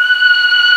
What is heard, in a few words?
MELLOTRON; East West - Global Sounds